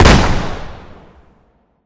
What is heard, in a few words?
hit